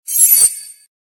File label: Beep; i-mobile; i-note-IE6010